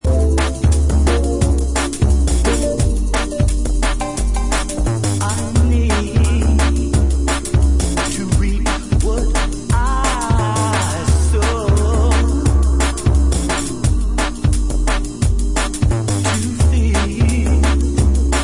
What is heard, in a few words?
Drum Bass